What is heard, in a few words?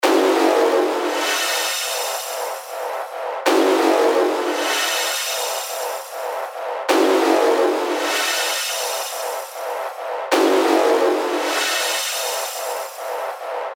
FX Loop (140 BPM); Redux; revealed fx loops vol 2